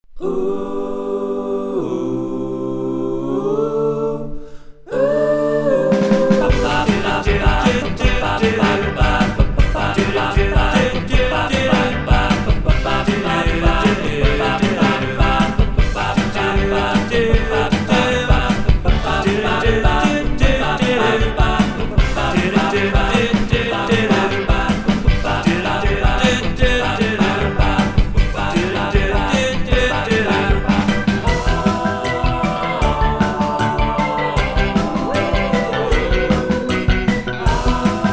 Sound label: kids vocals